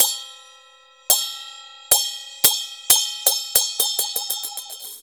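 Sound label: CHINA SM4 L, China cymbal; Spectrasonics - Heart of Asia CD2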